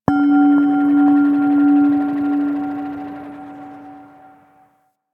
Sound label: Temple Mid Bells; Ambience; Warframe; Corpus Ship Remaster